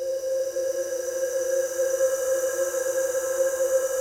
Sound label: HOLLOW BLOW; Pro-Rec - Synthsonic